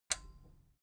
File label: metal button stereo release enable